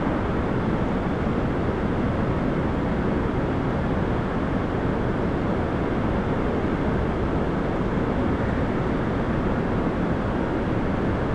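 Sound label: ambience fan vent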